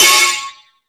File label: Crash, Drum Machines E-MU Pro-Cussion